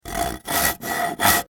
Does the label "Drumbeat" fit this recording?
No